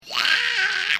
screech yaaa

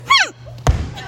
hmpf stomp

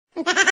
baby laugh short

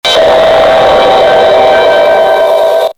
gong loud

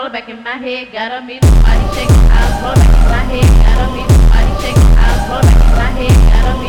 phonk; asphalt

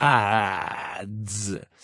azzzzhhhhh

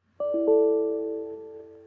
windows error; windows 10

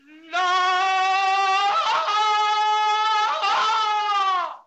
tarzan yell

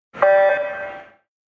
swim start buzzer sound

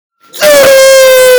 earrape

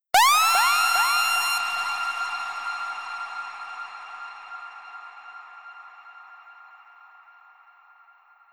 siren

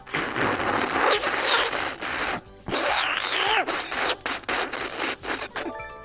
slurping water

